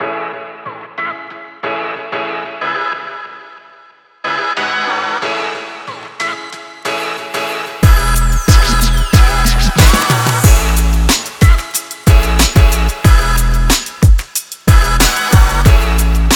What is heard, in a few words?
Summer Hip-Hop